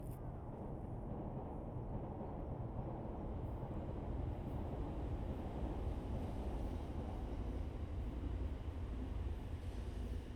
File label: Binaural